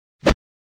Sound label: Swish Single; Crashes Impacts